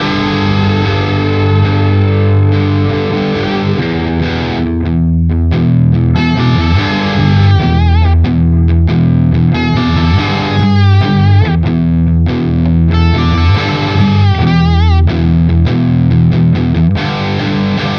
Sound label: Maybach Lester Junior Double Cut Bridge Gain